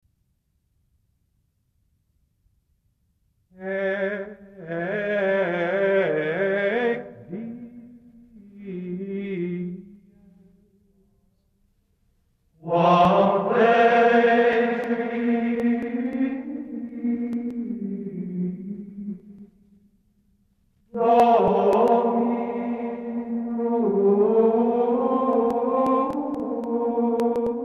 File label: Gregorian chant; Haec dies